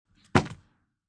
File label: Thrw Wallt Tble, Sound of leather wallet thrown onto wooden table.; Wallet Thrown on Table 1; Thuds; Objects